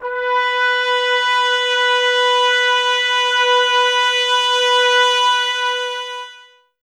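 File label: brass trumpets B; 90 s Sample CDs; Miroslav Vitous; Woodwind Brass Ensemble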